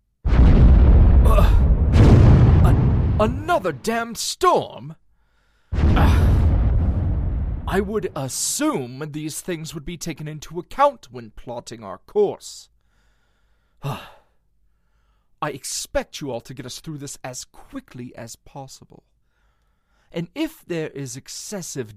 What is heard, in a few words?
Space Storm SFX